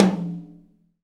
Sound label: TOM, Roland, Real Kit